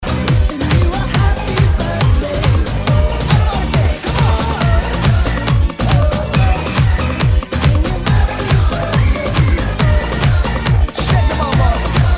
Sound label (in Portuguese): sertanejo remix e electro dance